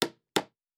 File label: Nail; Fantasy Sounds Bundle; Craft